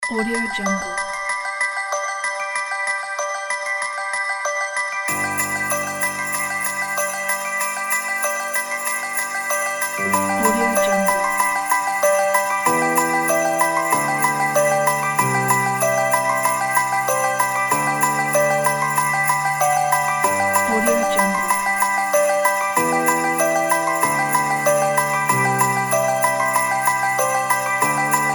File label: christmas gift